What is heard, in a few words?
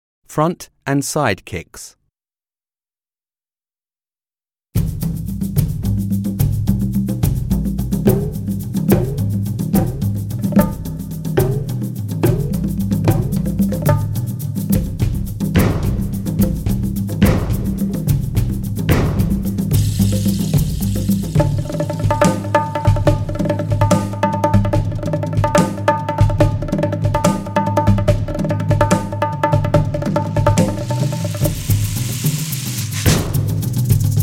Front & Side Kicks